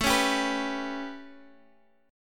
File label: A 9th, Guitar Half Step Down